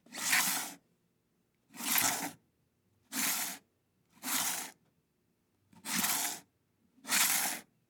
Cell Phone Smartphone Slide On Table

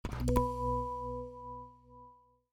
Podcast Transition Sound

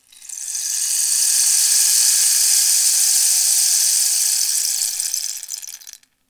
Rainstick

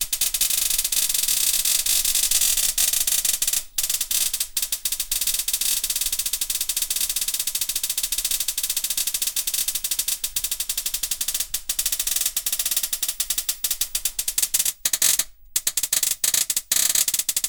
Cathode Ray Tube Failure

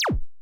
Laser Shoot

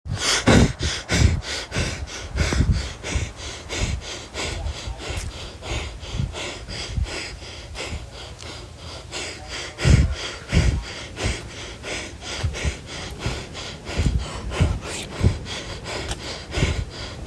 Heavy Breathing

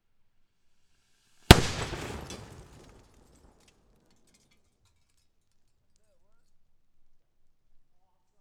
Sharp Explosion Lots Of Debris

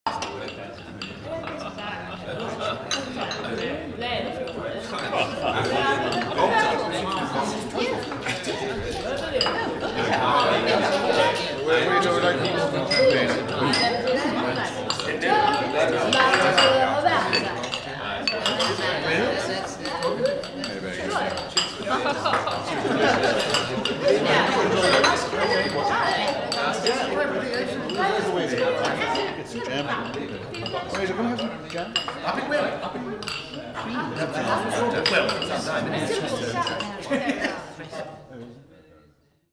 Posh Dinner Party

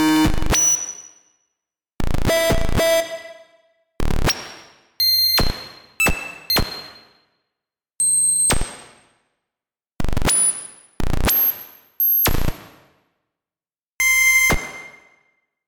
Beeps

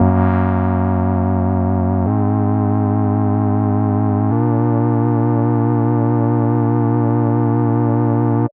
Synth Up

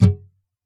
Strum Mute Up

